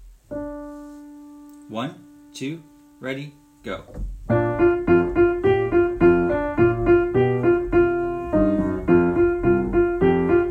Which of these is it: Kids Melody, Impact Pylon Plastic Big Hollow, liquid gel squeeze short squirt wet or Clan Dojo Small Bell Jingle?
Kids Melody